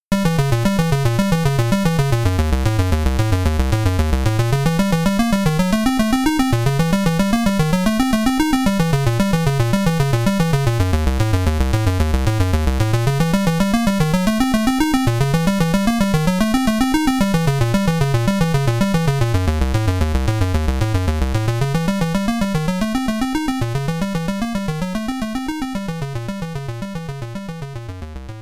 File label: Boss (PSG); Knightmare; MSX